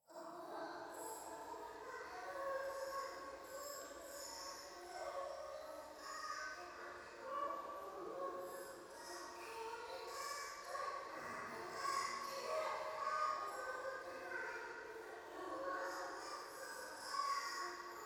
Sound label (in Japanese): 低音喘ぎ 雨; 夢の中